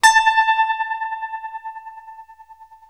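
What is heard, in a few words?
tremolo A 4, guitar; East West; Steve Stevens Guitar Samples Collection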